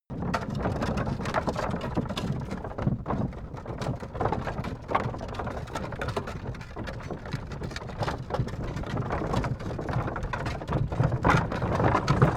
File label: carriage drive concrete rattle